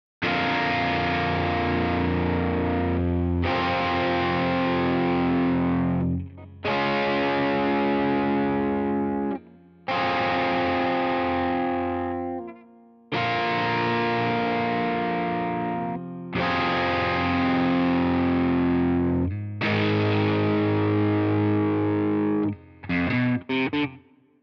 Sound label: Guitar